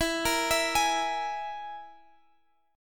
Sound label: E Major Flat 5th E down Guitar Half Step Down